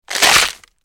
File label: Wood Splits Rips Wood, trees